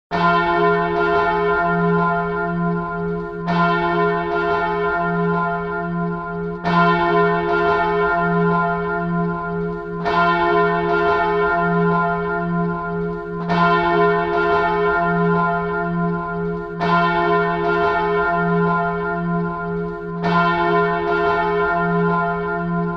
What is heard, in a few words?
church bells